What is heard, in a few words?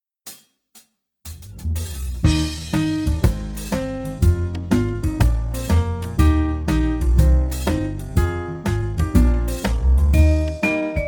I love the flowers backing track down 2 st SLOWER